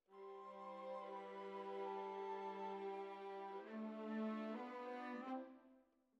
Violas, jazz for piano

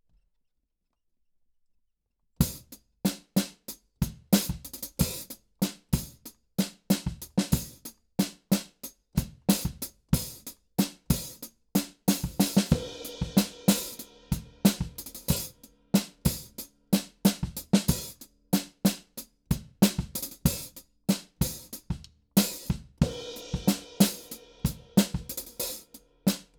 KIT

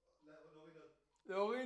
TB GTR

